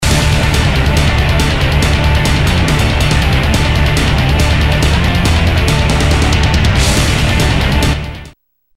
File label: Quick Match; WWF SmackDown 2 Know Your Role